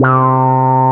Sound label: QUAC, QUACK SOLO, Synth; InVision Interactive; Keith Emerson; The Most Dangerous Synth and Organ